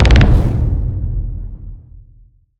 spring festival load

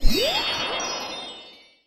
magic gleam

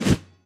whoosh

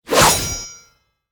sword whoosh heavy

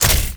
mecha hit

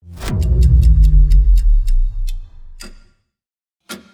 time stop

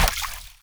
water splash

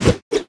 dualswords

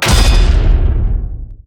skill huge axe hit

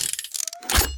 bullet loading

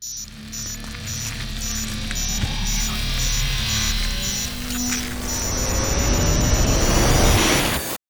skill attack cast

